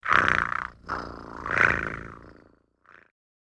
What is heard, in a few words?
Pet Cat Purr; creatures pets